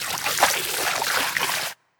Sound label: water; Monnlightwater2